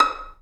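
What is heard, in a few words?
Vio pizzicato Eb5; Digital Sound Factory Virtuoso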